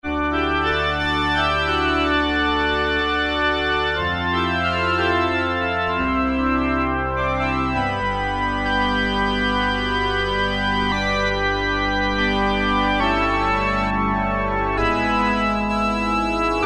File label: PipeOrgan, Roland MKS-50; Factory examples